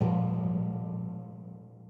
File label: timpani